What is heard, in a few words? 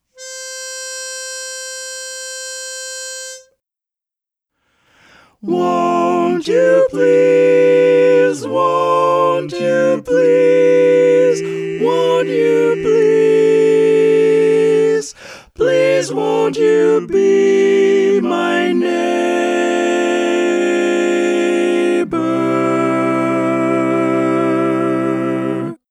Tenor, barbershop